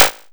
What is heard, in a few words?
Sn FX Beam Synthie